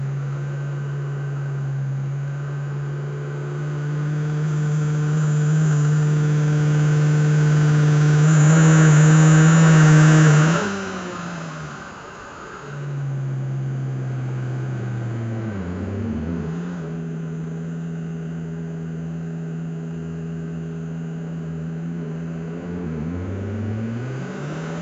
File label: from inside a moving car; automobiles